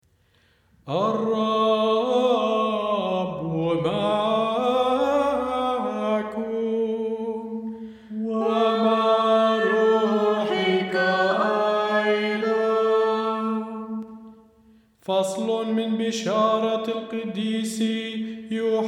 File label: Gospel Melody; Appendix; ألحان الإنجيل ج